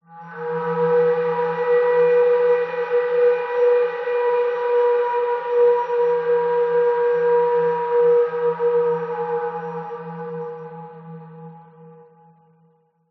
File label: horror droning airy whistle blow atmos dark mysterious; zapsplat